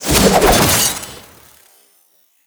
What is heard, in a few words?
Kuva Lich Finisher Parazon Jump Strangle; Warframe